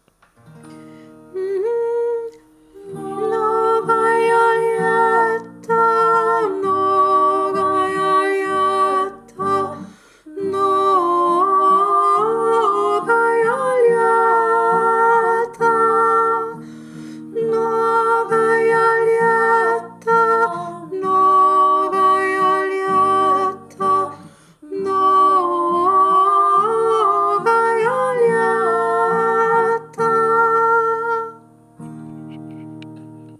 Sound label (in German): mnogaja lietta hohe stimme